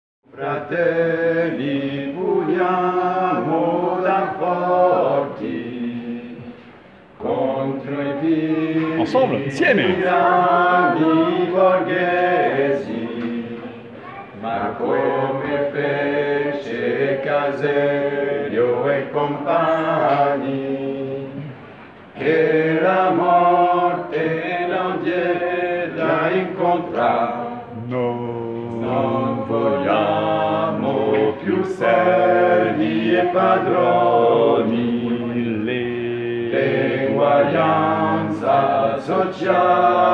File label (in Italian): tutti; Su fratelli